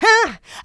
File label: vocal house adlibs; PS-28 Vocal House Samples